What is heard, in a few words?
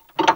CLOCKERS; Spectrasonic Distorted Reality 1